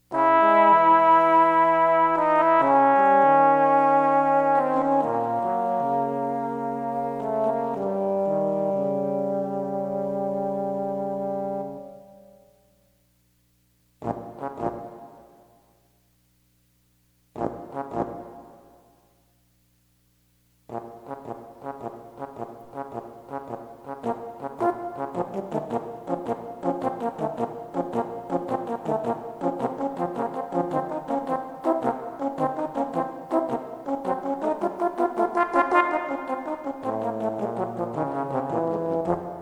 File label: Sorcerers Apprentice Two Bones